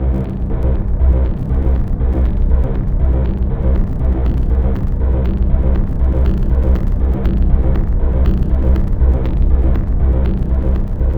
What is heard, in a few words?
C1, SynthLine; 90 s Sample CDs; NiceBeats; SynthLine VOL-7